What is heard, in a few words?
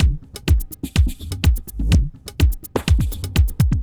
ethnic groove 125